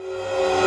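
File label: Judge Cast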